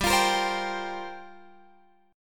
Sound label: G Minor 11th G down Guitar Open F; Gm11 strummed